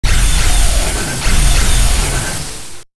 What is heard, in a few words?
valkaryne evade; Quake 4 Alpha; id software; strogg